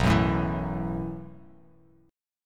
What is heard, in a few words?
B 7th Suspended 4th B down